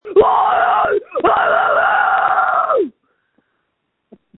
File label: screams